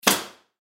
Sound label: Nail Gun Shoot Thick Wood Single Brad Nail; Power Tool; Industry; Blastwave